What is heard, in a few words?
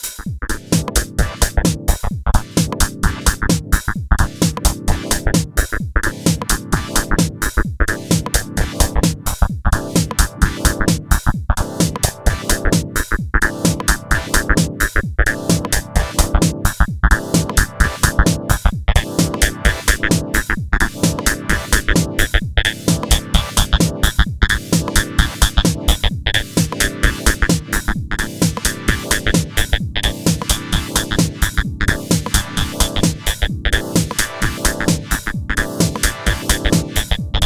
System 500